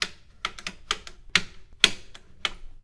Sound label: Pay Phone Receiver Toggle; Home & Office; 1001 Sound Effects